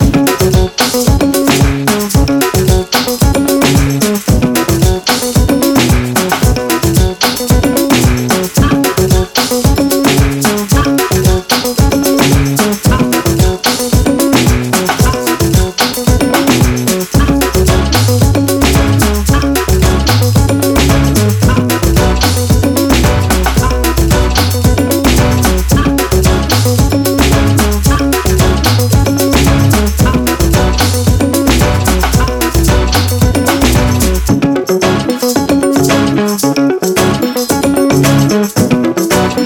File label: AfroStep